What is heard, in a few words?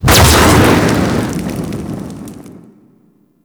Flamethrower Fire 3D; Weapons